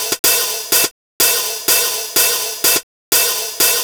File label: drum monday hat 125, Drum Loops, Classic Chicago House